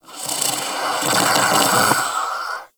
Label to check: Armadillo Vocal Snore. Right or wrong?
Right